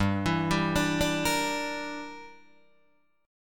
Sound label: G Minor 6th, Guitar Open D